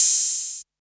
small reverb open hi hat one shot A# key, open hat tuned to the A# note